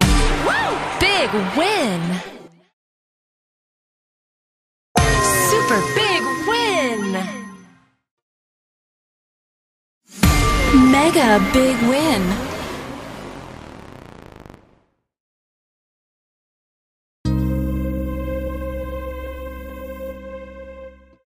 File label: Win Sounds Big Win; playboy Gold; Mahi Gaming